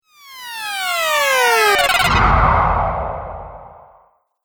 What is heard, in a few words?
Trailer Hit Videogame; Trailer Sound Effects